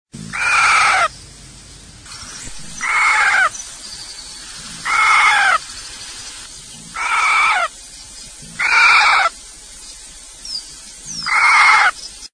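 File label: corncrake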